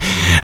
vox, Human Perc

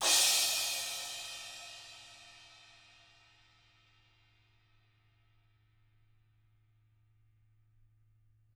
TAMA, Drums

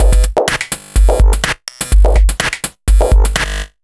Drum Loops, 125 BPM

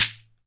SHEKERE, Synclavier Percussion; ILIO

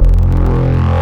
Spectral Growl, wavetables

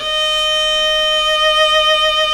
A#3 SOLO CELLO; Northstar; New Gold VOL-2

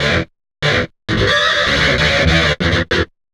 GTR LOOPS

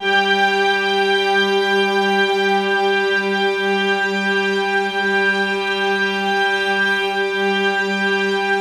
Band Orchestra G6, brass; Giga Samples Collection

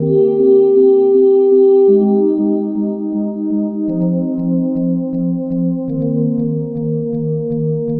Pad, Trance

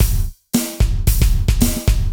CB LOOP; Zero-G; Chemical Beats

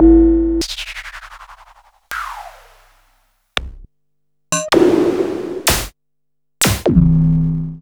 Yamaha CS-40M

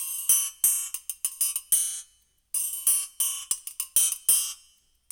DNCE, World Dance, Dance Coil

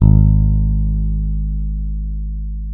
G#1, FINGER MUTE; Xsample VOL-1

